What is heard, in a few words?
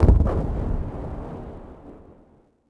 distant explo